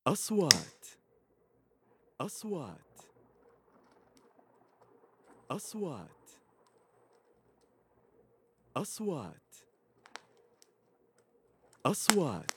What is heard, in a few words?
voice of flame flame incense oud; kitchen